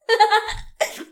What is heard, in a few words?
giggles and laughter; SyrinScape